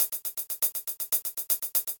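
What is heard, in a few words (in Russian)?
closed hats, Ритмическая фигура закрытого «хэта» 1; чем выше столбик, тем выше громкость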